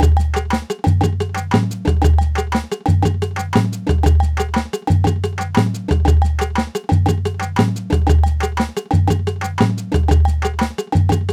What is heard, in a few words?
Final Section Groove; Percussion